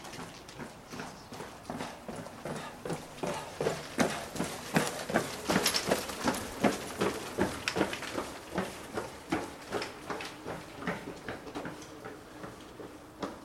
Jogger laufen vorbei

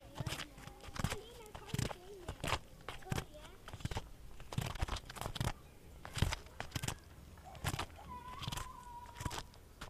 schritte im sand

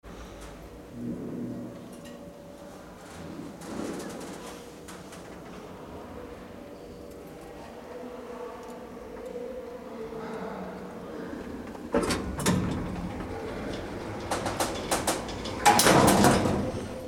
aufzug kommt

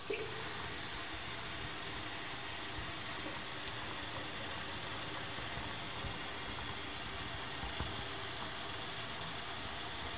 wasserhahn

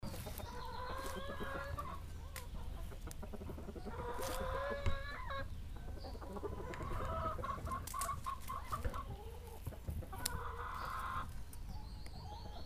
huehner

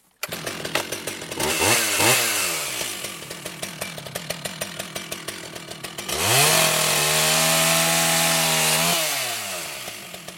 kettensaege

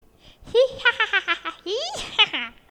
hexen lachen

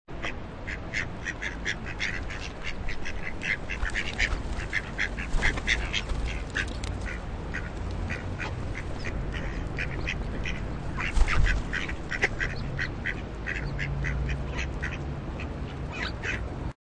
enten

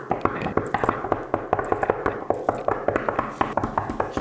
Tischklopfen

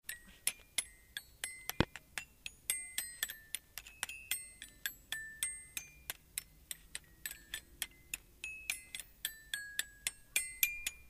kinderglockenspiel

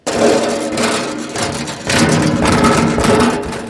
fuesse gegen die bande

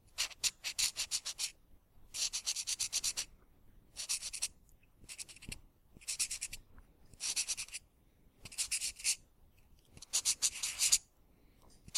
Edding

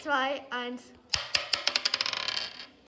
Würfel

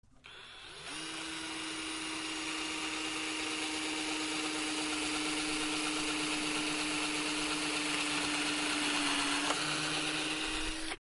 akkuschrauber

